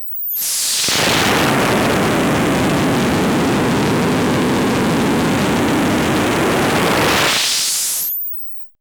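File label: OSCILLATOR DEATH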